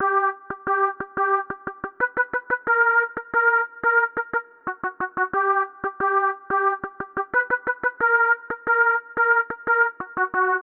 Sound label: HipHop Synth Pulse D min; Digidesign; Pro Tools Essential Loops